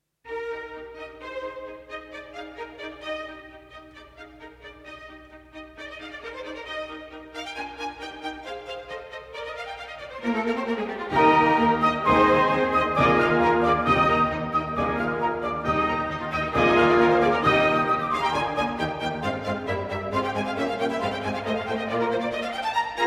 Trumpet Concerto in E-Flat Major, Finale (Allegro); Haydn; Hob. VIIe1